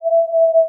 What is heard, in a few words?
Beep High Pitch; Adobe; Multimedia Internet CD-Rom Flash